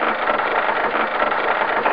Train; butthead; plats

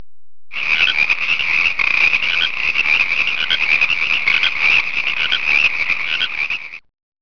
FROGS

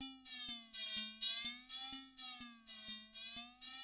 HOUSE 125

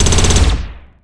auto can; StarSiege

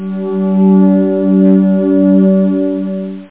string

